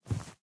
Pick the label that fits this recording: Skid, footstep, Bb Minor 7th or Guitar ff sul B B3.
footstep